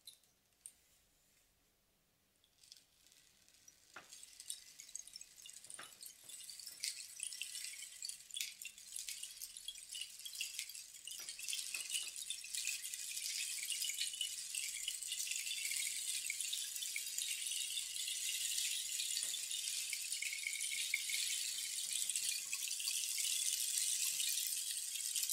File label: Rainsticks; ساز باران